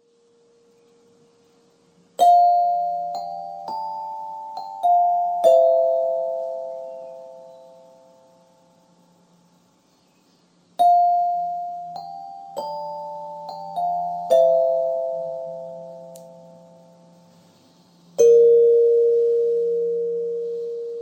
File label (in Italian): metallofono